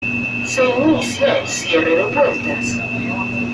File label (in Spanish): puerta metro